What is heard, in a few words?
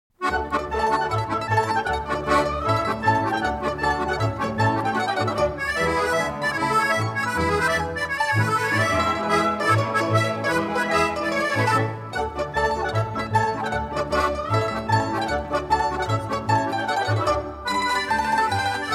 russian folk dance